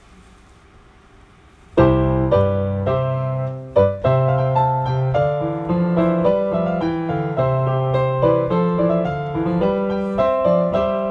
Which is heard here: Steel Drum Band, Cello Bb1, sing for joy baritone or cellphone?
sing for joy baritone